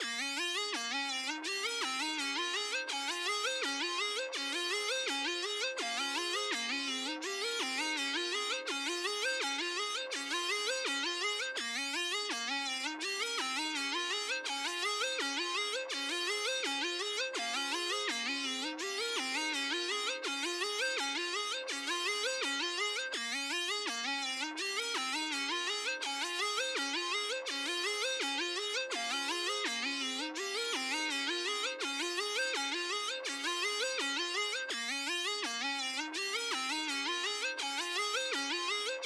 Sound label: 166 BPM G# Maj Arp; Melody; Loop Stems; Cymatics; 2022 Melody Collection - Wavy; Foreign